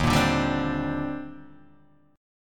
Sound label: D sharp Major 13th D sharp Guitar DADGAD, chord; 1,1,0,0,3,0; chordshape